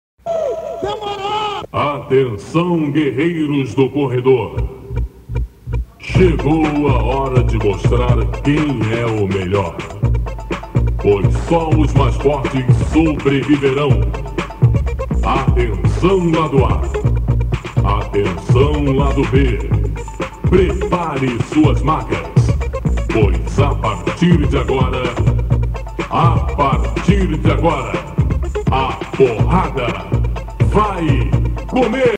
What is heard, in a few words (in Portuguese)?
abertura baile funk